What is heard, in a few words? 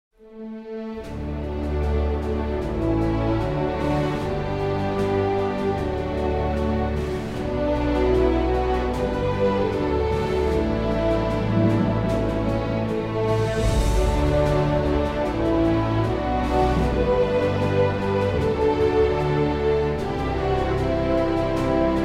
instrumental